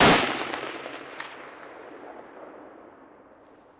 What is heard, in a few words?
m24 firing